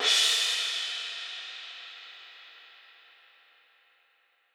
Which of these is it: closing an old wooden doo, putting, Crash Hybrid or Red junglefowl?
Crash Hybrid